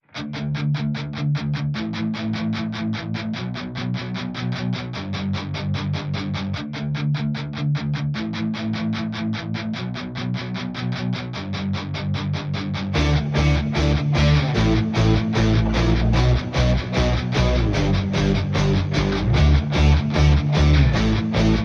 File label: Guitar and Bass Only